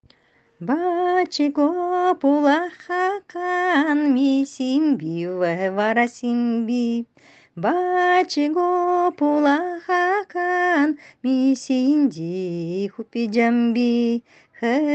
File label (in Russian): Песенка про сомика